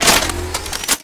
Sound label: Gun Weapon Pickup; SFX Kit; Pickups